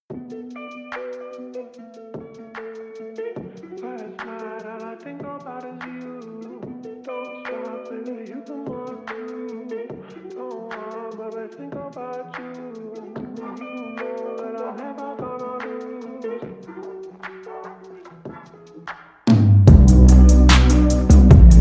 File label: Slowed Reverb